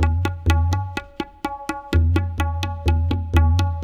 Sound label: PERCLOOP ETHNO 125; eJay